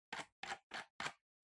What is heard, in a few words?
PC Mouse Far Scroll Fast